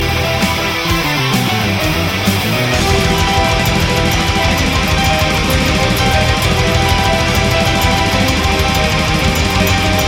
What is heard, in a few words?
ring tune